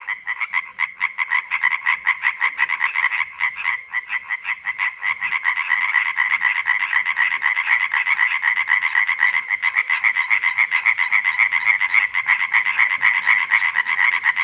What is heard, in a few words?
Hyla arborea Laubfrosch Rufe